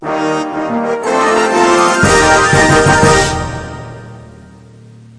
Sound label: super cheeseball lofi 80s tv intro; SAMPLED MUSIC